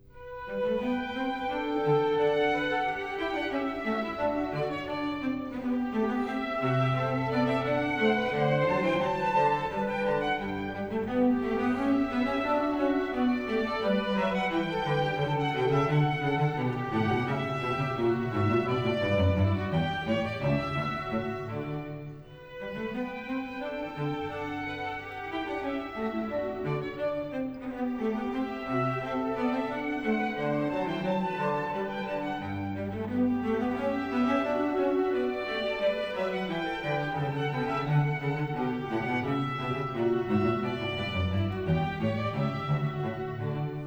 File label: Variation 18 Canone alla Sesta, strings; Bach; Goldberg Variations; Sitkovetsky; NES Orch